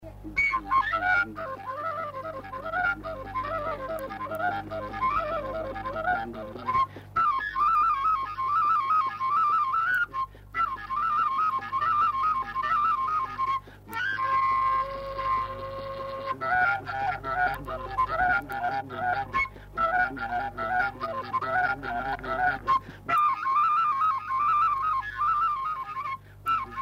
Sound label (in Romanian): Mana pe batai la fluier; jocuri si cantece batranesti; Vaslui